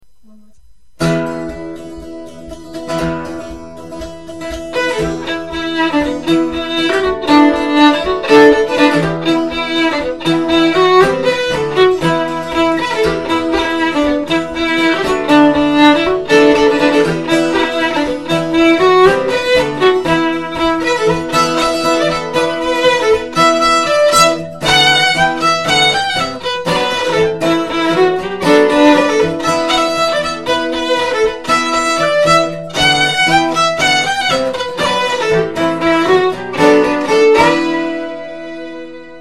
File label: the quarry cross with a few ornaments and guitar harder, fiddle